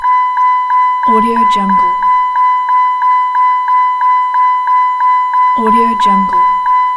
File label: indoor car ping